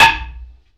Wooden

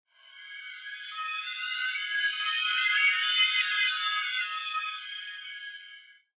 Glass Metal Resonant Transition

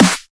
Marker

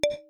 Button Selected

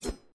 Metallic Whoosh

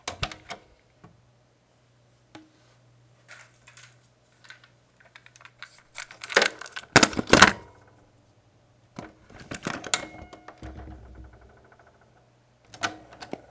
Eject insert play cassette

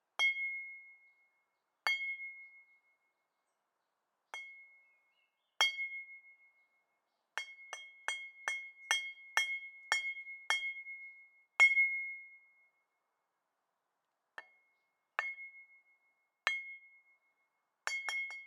Aluminum Baseball Bat Impact On Concrete

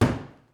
Thud

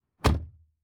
Car Door Closing From Inside

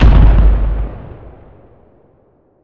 Explosion Far

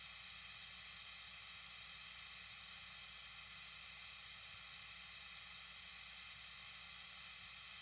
Guitar Amp Noise

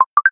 Message Notification

